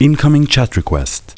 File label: Chat, mobile polyphonic ringtones